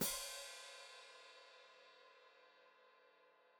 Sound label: Hat Closed Open, Hi-Hat